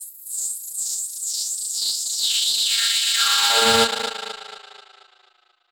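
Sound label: Filter Processed, alarm siren samples 125bpm; musicradar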